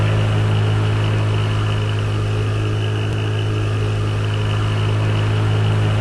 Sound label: ferry travel